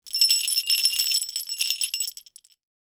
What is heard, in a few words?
jingle bell